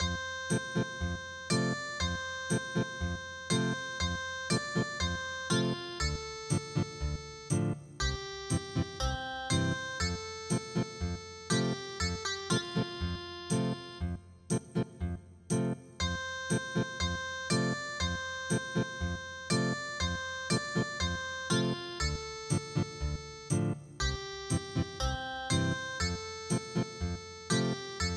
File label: Ethnic Guitar, traditional; Sing Me Song Of Araby